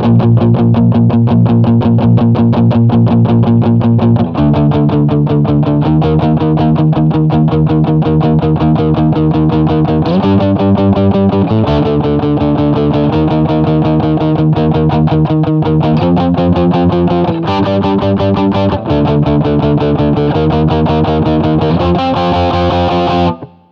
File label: CHUG